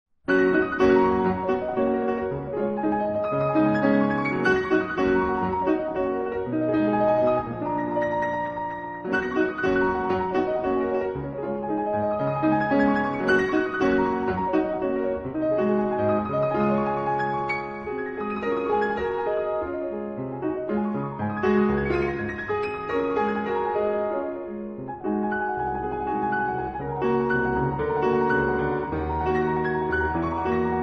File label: Polonaise in G flat major; Polonaise No.5 in G flat major, Op.10-5 “Black Key”